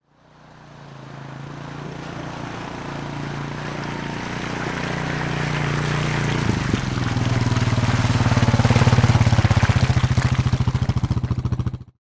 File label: transportation atv with trailer approach stop very muddy wet; sound effects one